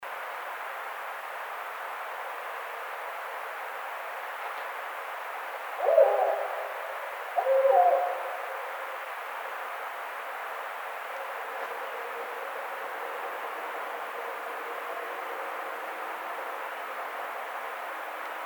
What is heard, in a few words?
Barred Owl